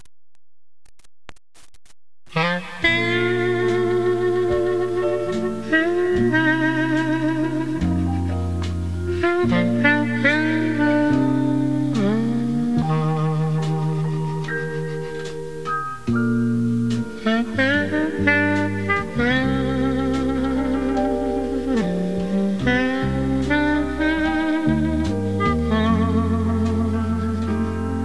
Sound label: Instrumental